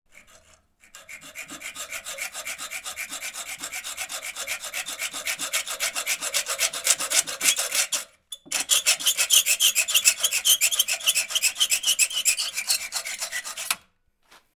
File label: hand sawing metal stereo; Digital Rain Lab; Woodwork